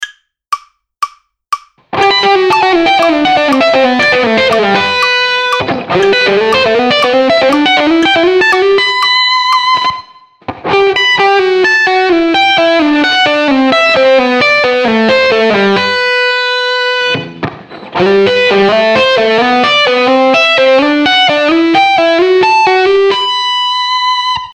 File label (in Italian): Hybrid Salto 2 corde